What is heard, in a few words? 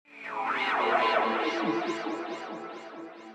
Keys